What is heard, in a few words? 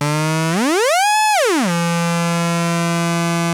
ARP 2600 E4, synthesizer; Hollywood Edge - Giorgio Moroder Rare Synthesizer Collection